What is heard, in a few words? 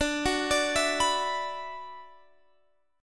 D 6th Add 9th D, Guitar, Open F; D6add9 arpeggiated; x,21,18,21,19,18